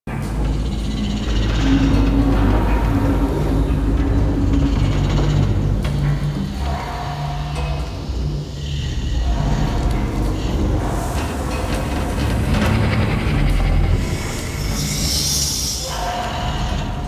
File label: Transition Room Loop, Ambience; Warframe; Entrati